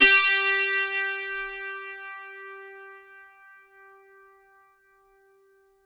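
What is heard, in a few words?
G4, dulcimer pluck; SoundBytes; Early Patches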